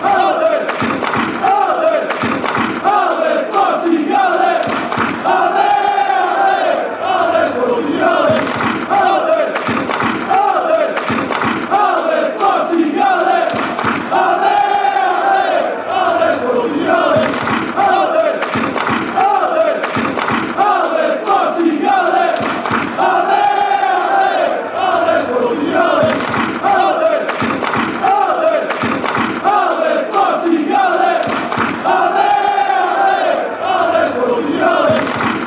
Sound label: allez allez, fan chants